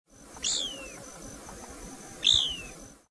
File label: Tyrannus couchii